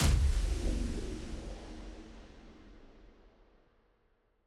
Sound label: High Impact